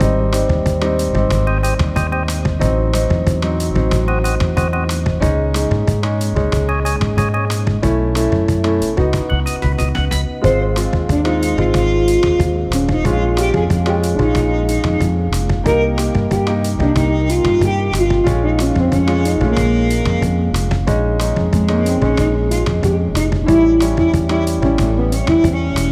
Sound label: urban shop bpm92, Music for shop theme. This music is loopable.